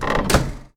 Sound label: chest close; minetest; capturetheflag